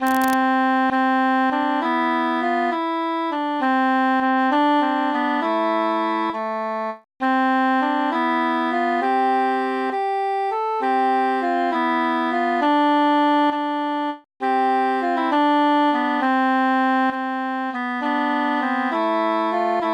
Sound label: cantus mute